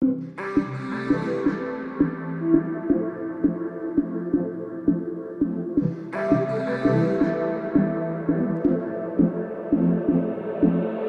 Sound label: ambience